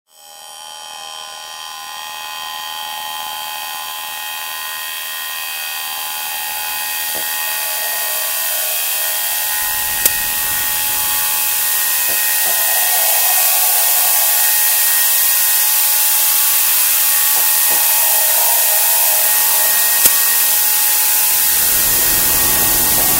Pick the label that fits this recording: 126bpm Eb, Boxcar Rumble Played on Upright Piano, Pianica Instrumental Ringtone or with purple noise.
with purple noise